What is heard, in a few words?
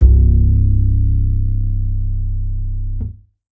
bass acoustic